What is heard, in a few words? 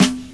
drum hit clap